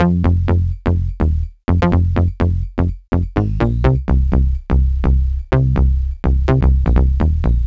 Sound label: BSE 125 F, BASS LOOPS, House; Best Service ProSamples vol.10 - House; AKAI